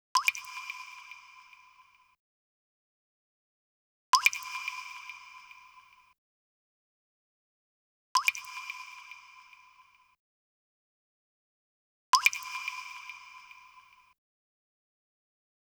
waterdrop, Drum Tracks, 060 Abmaj

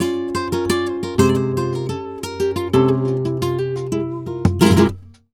flamenco guitar, tango; Best Service ProSamples vol.46 - Flamenco

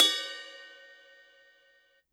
Ride Cymbal Cup, YAMAHA RM1X

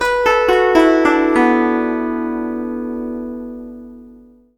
GUZHEN; Spectrasonics; Heart of Asia

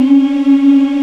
African Mm p; Roland LCDP11 Africa VOL-1

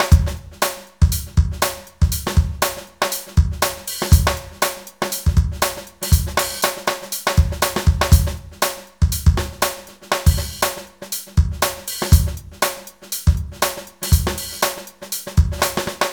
BASSTARD 120

